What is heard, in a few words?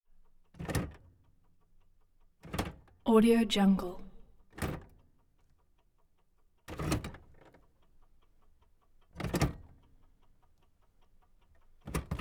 1971 chevy chevelle car gearshift; prosoundfx